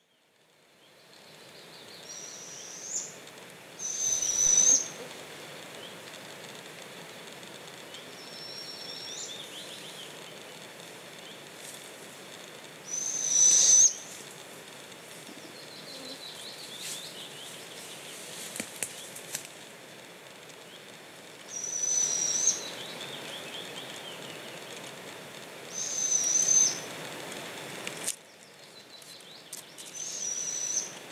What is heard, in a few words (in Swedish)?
hokuggla; fågelljud